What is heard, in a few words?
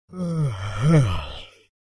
Long tired yawn from a male